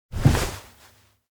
open door classroom; anemoi

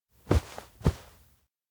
back pat; awayuki

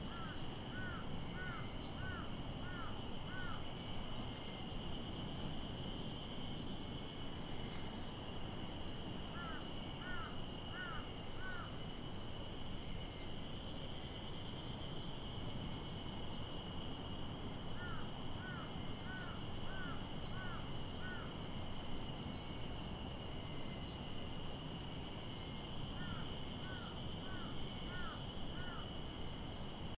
outdoor town evening

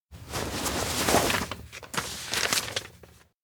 backpack map take spread; anemoi

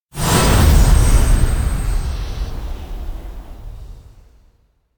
elec windcorridor door open; anemoi

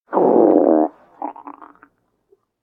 stomach growl; anemoi

